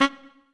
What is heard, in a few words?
Trumpet melo